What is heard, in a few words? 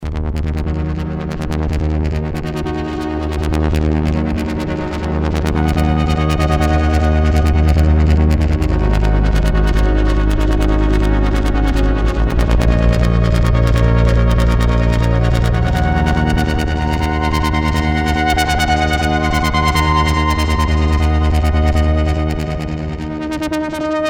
Korg DSS-1